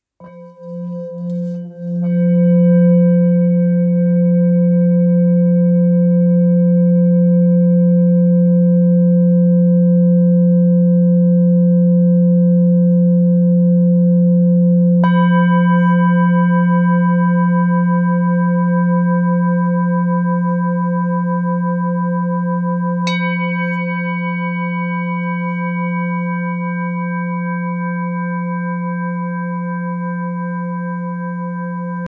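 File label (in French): Bol super lune belier fa, bols chantants tibétains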